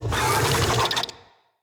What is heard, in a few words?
Infested Big Ashen Vocal Attack